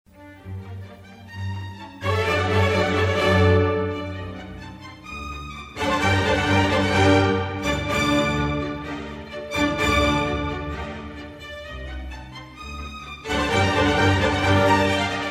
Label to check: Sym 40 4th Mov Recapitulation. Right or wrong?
Right